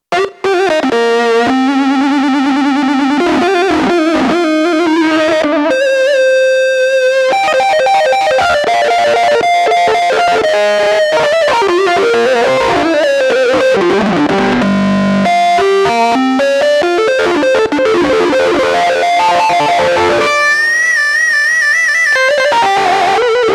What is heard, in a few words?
Velo-Crunch, Roland JD-800; Factory Patches